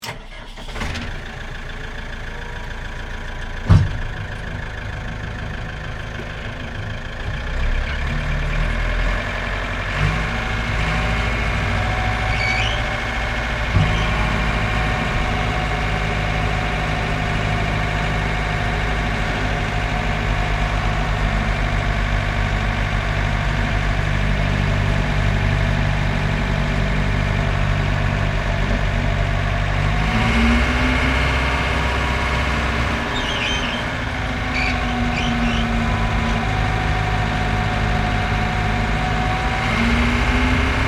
Tow Tractor Activity, Industry, Tow, Tractor, Activity, Start, Drive, Off, Interior, Perspective, Diesel, Engine; Pettibone; BlastWave